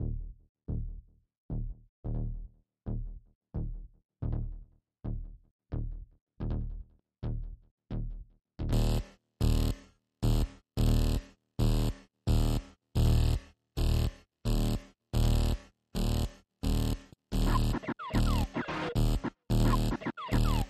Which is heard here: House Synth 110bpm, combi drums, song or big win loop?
House Synth 110bpm